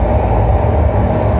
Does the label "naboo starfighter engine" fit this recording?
Yes